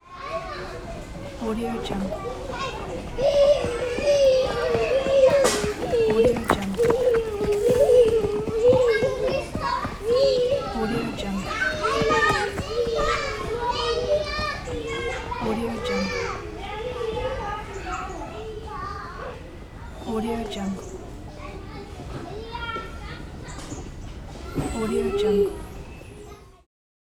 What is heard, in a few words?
children playground